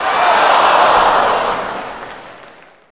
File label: OOH